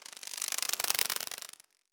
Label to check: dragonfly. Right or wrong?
Right